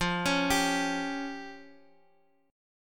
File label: F Suspended 2nd Sharp 5th, down, Guitar, Open F; Fsus2#5 arpeggiated